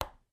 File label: tac; jocly; checkers